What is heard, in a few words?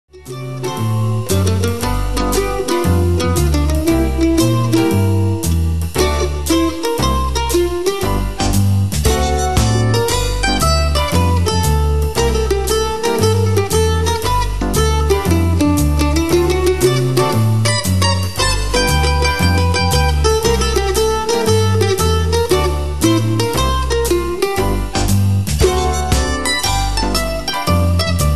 MANDOLIN BLUES